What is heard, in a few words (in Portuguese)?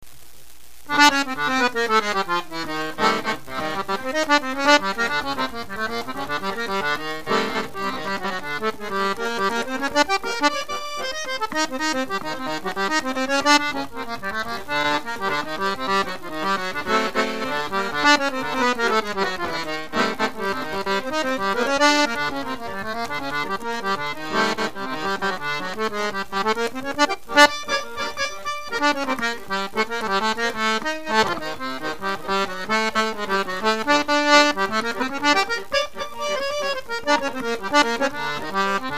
acordeon chorinho pe de serra